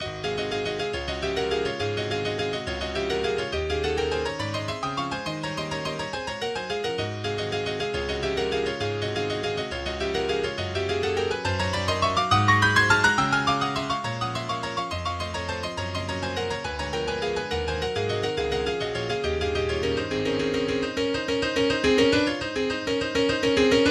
Etude No20 in D Flat Major Sixths Vivace legato

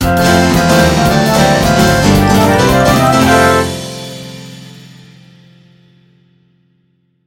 Section Completed Game Completed

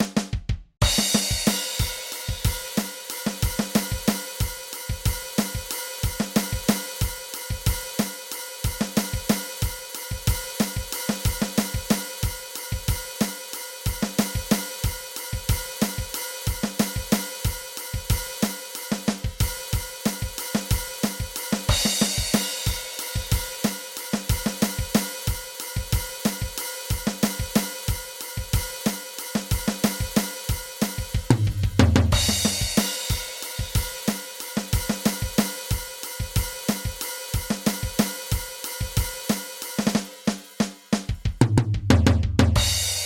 Drum

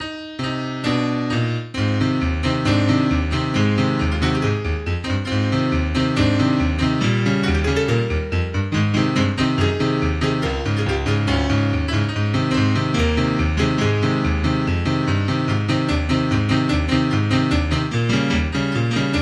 Simple Piano Version

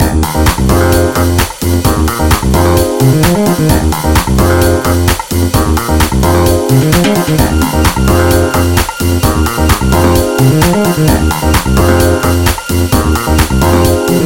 Blackberry tones

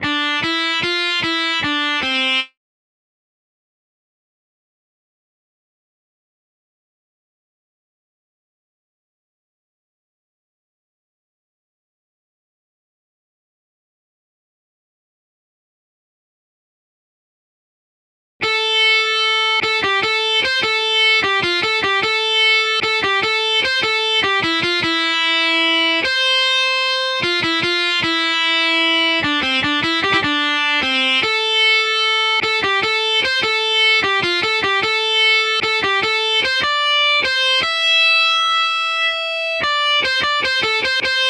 Guitar Solo in C Major

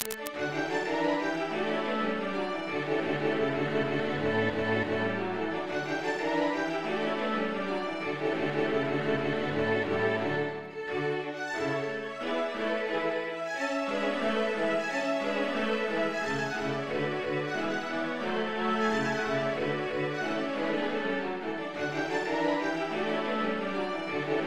String Quartet in D major; Mozart String Quartet in D major No.23

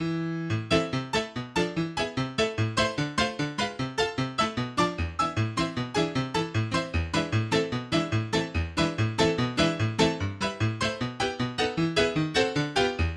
in A Minor Agitato; Etude No16; Opus 25 No4

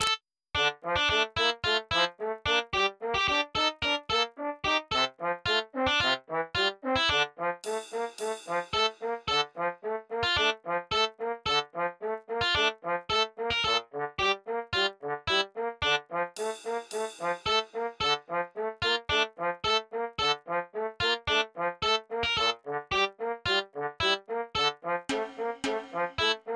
German WW2 Song; Germany

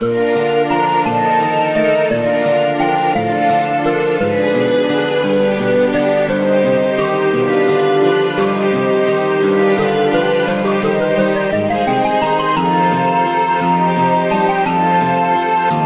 Menu Screen

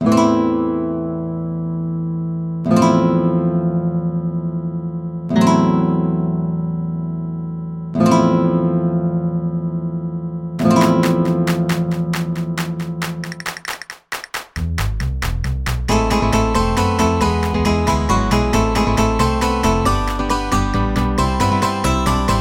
Flamenco

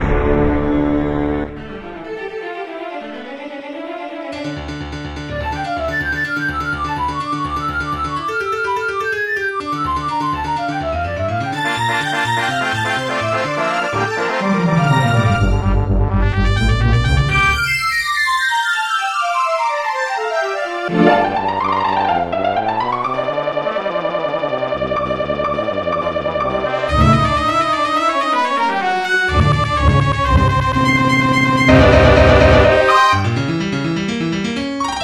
Symphony Movement in A minor Tarantella

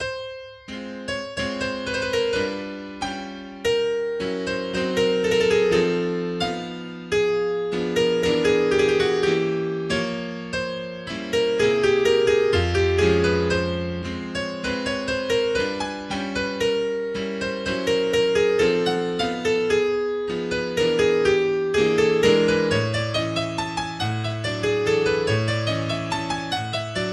Mazurka in F Minor Andantino

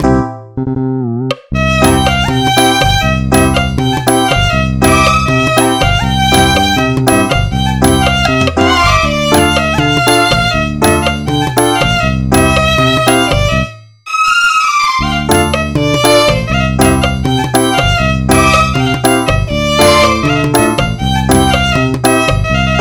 nokia arabic ringtone

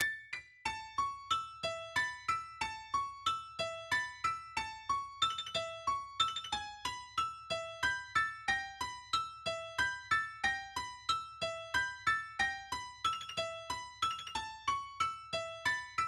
Music Box

